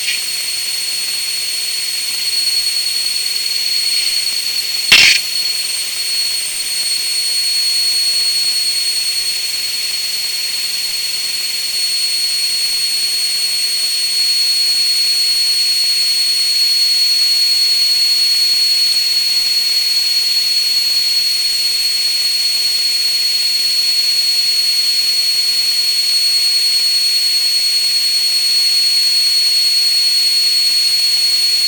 recording CFL ultrasound tone recorded with ultrasound mic